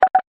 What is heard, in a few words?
Notification; Realme GT5 Pro